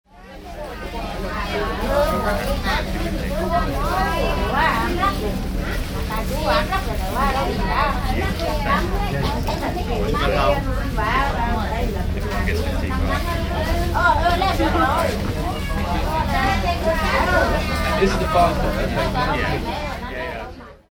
kophangan waiting, thailand

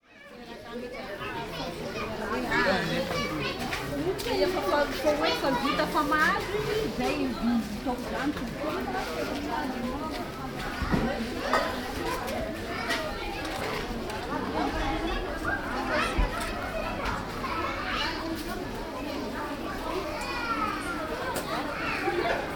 Ambato Boeni Night Market

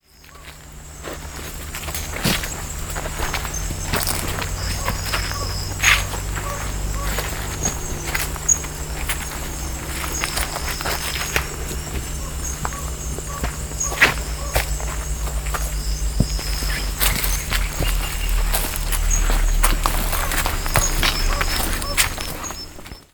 Ankarafantsika Night, madagascar

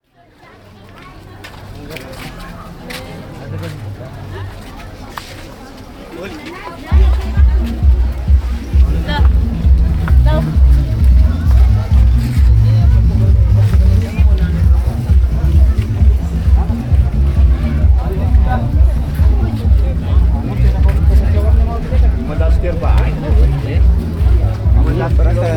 Ambato Boeni Day Market

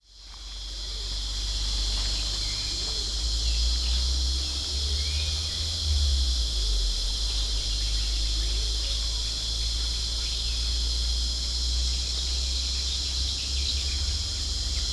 Ankarafantsika Day, madagascar

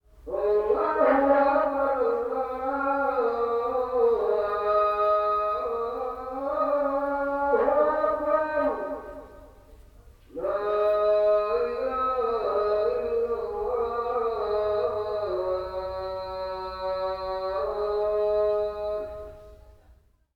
Ambato Boeni Hotel, madagascar

